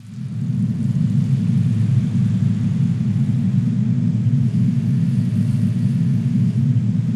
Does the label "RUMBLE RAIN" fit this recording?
Yes